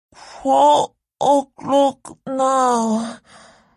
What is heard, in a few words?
clock